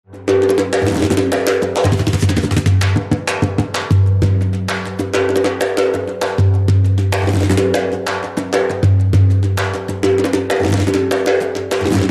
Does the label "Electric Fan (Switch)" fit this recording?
No